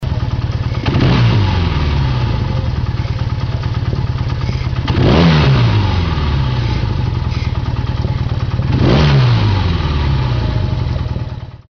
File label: yamaha 750 tx, motos